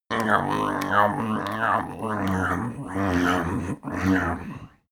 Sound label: male eat feral; koerpergeraeusche; menschen; soundpool